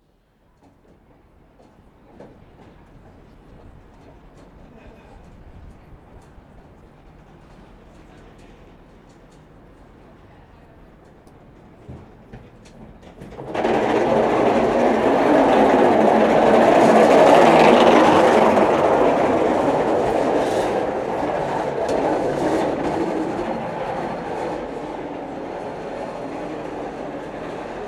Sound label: underneath metal bridge near temple meads train station